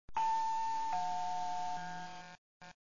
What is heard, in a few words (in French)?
sonnerie bis